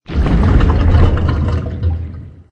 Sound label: Removable Water